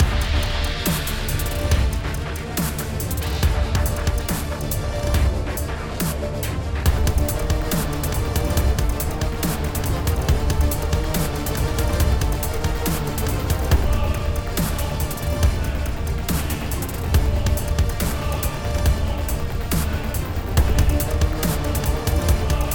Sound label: Dubstep Mix; karnage chronicles vr; Level 3